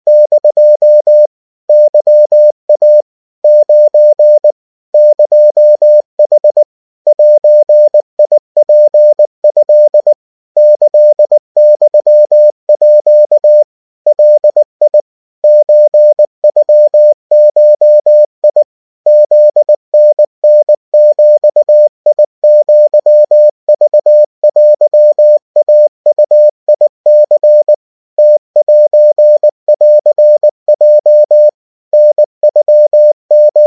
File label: Japanese 70cpm